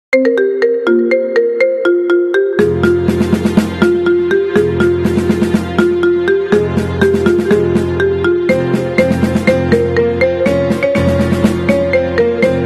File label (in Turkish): Telefon Zil Sesi Marimba Remix; La Casa de Papel